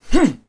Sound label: THROW; Messiah